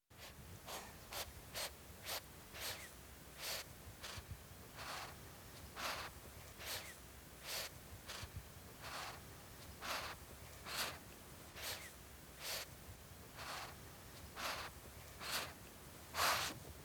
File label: Cat Sniffing; BBC Sound Effects Library